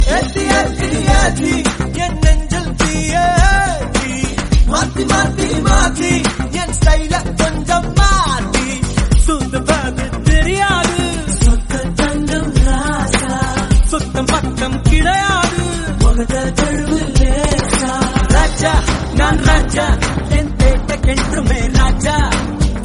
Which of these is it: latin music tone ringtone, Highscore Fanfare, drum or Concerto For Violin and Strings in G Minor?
latin music tone ringtone